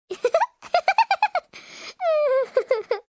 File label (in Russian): smeh